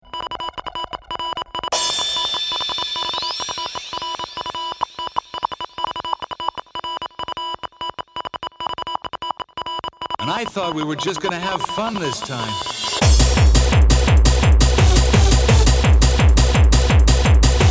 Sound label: Acid Break